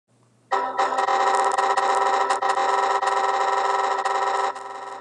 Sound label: 100 errors winxp